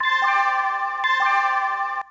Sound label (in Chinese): 总功报警; PSCADA; 电子行业模板